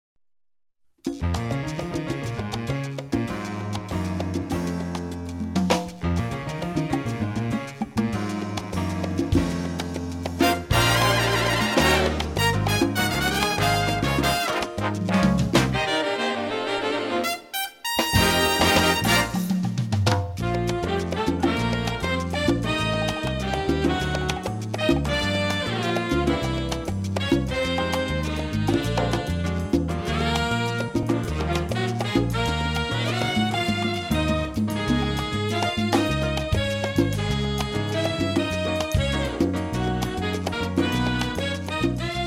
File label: Jazz Orchestra